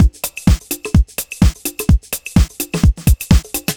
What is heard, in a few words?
Disco, Drum Loops, 127 BPM; Sonic Foundry (Sony Creative Software); Underground UK House & Electro